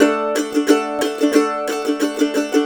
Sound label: COUNTRY; Best Service Advanced Media Trax Modern Composer